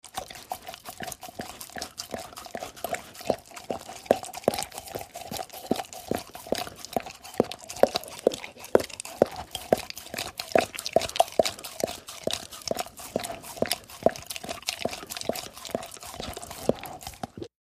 Dog Licking Mug

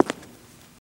FS Male On Hardwood, singles wood

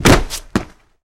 Body Falls On Cement

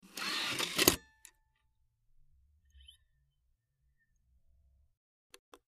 Cd Rom Door Open Tray, computers

